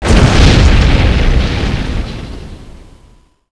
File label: crash; Big bang